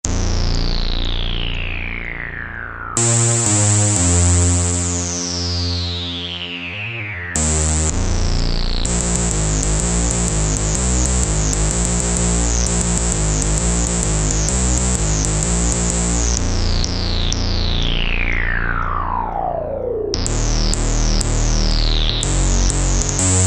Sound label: BASS